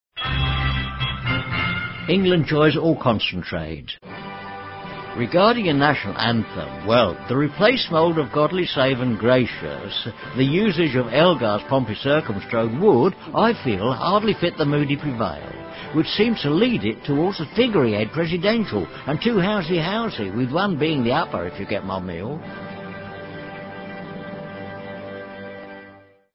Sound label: anthem